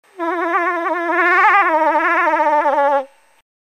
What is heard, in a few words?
Young Cow In Heat Short Call, Moose